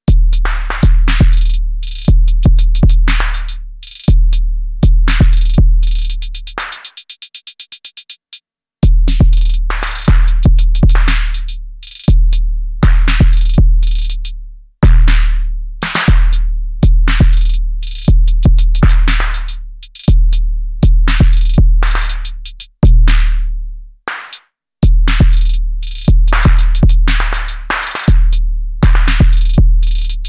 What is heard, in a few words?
Arturia DrumBrute Impact Pattern